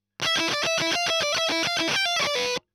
EL Fuzz End

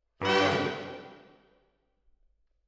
MKH AB, piano

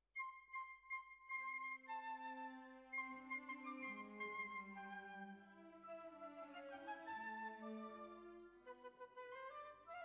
Bassoon, Jazz for piano

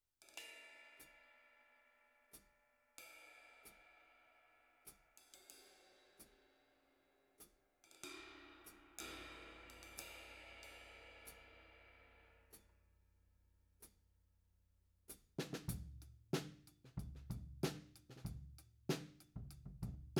Floor, 94bpm

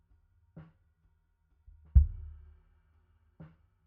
Sub

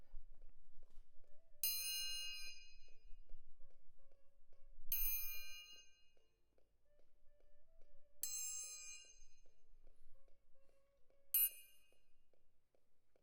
Perc Close

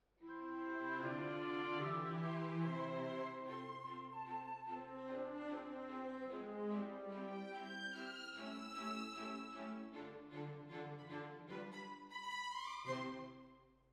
Hall, Jazz for piano

